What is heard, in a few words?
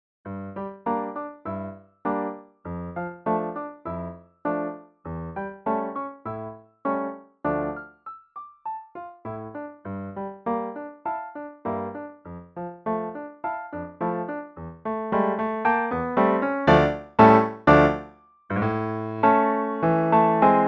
TWO GUITARS; Primo & Secondo